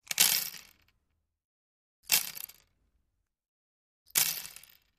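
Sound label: Box Matches Matches Drop; lighters & matches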